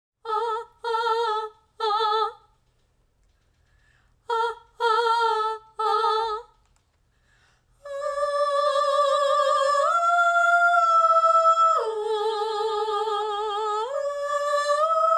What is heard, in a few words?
Orc March Choir